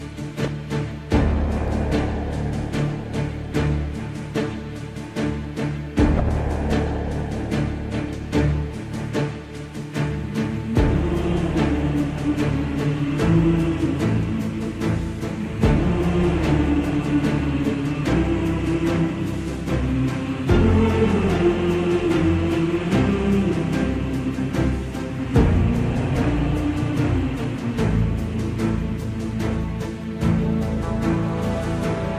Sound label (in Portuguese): Acorde Suspense; Batem Prof.·. a porta do Templo